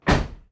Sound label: car door close